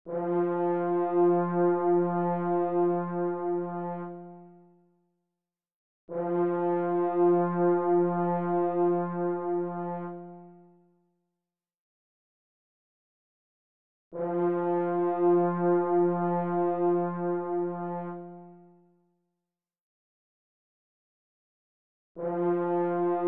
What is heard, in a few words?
Concert F Horn